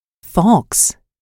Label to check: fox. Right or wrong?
Right